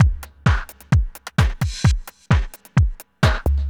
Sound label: dubby house 130